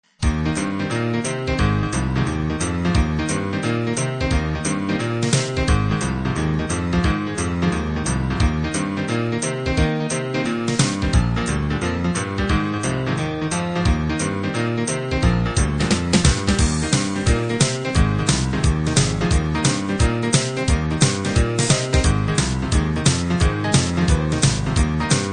boogie woogie

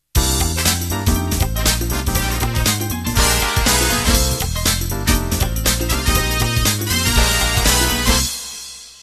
Groove Funk End

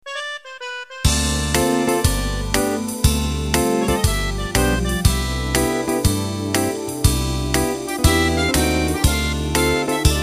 Euro 2 Beat Intro

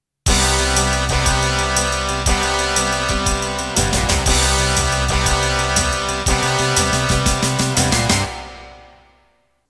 Shuffle Intro

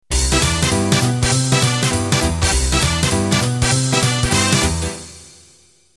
New Jive intro

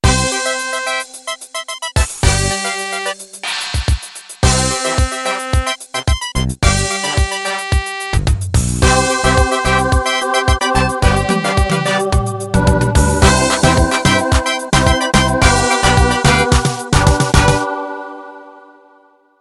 Intro

